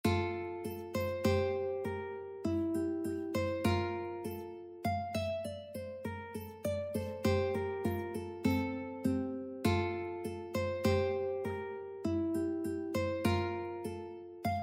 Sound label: duet ukulele arioso; Arioso by Daniel Gottlob Türk; classical ukulele fingerpicking duets